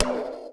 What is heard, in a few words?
Laser